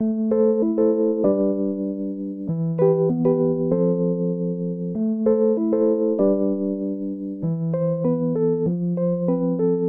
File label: Wurli Catchy pop Riff Am 97; Sample Banks; Melody; Keyboards